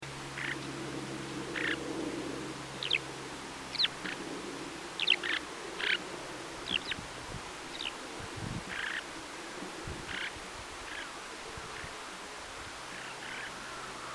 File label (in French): alouette des champs, chant